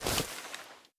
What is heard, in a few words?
foley; silverballer